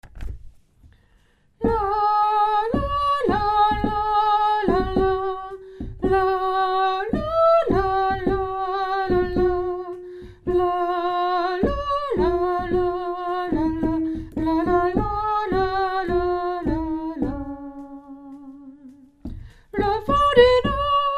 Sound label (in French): chante soprano